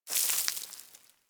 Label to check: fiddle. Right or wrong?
Wrong